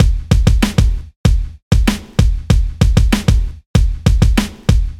96bpm Hip Hop Beat

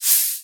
Open Bottle Soda

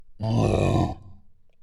Pain Scream Hard

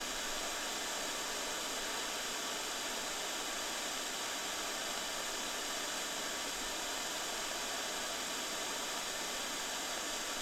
Tv Static

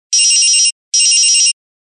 Stereotypical Mobile Phone Ring

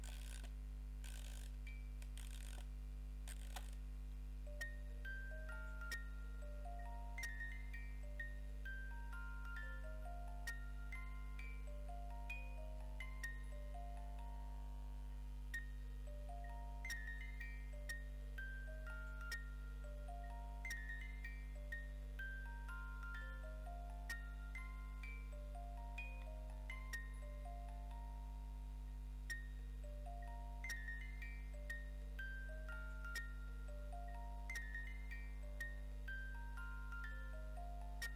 Musicbox

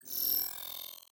Matrix Style Glitch

